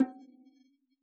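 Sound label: bongo low; AnlgKit1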